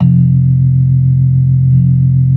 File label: B3 F 2; Best Service; Hyper Dance